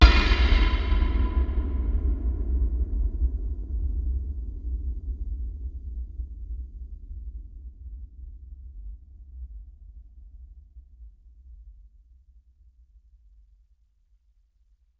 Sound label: Deep Metallic, Cinematic Impacts; 99Sounds; Rumore